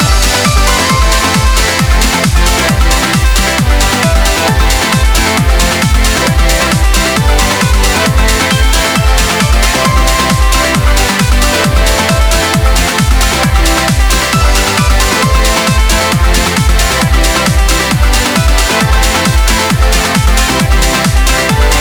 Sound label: Arp chord; arpeggio pattern; Reason